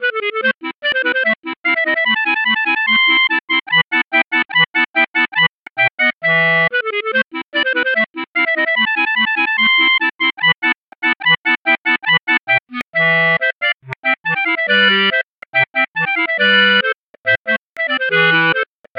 clarinet

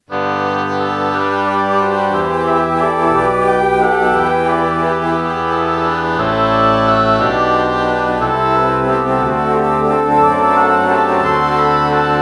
alto flute oboe morph

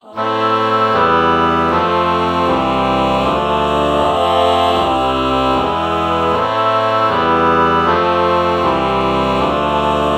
ah oboe chord morph

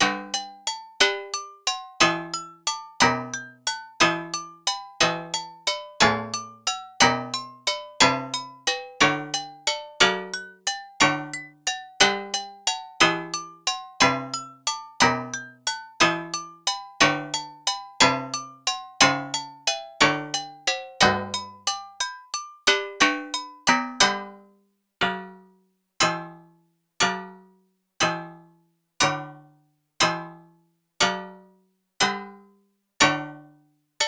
agogo bach

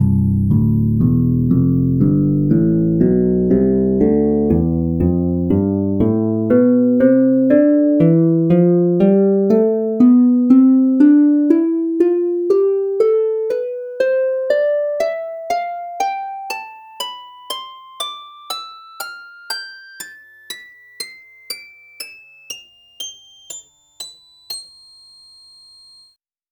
harp tonleiter

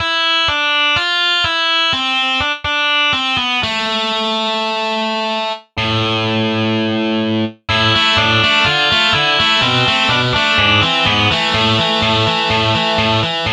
distortion guitar

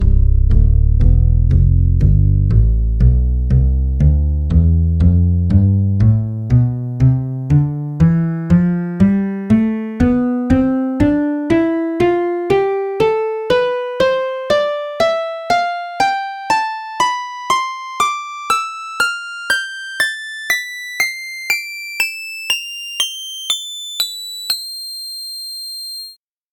acoustic bass tonleiter samples

